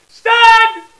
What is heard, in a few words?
stud Loud